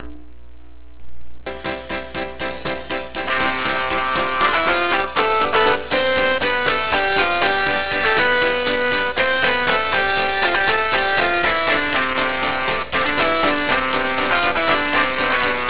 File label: duet